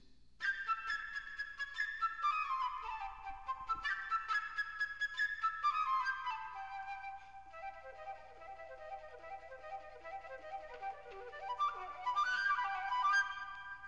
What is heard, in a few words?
bird flute